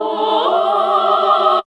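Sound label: classical singing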